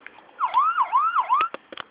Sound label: Siren